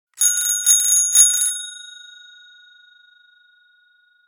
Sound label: Bicycle bell rings multiple times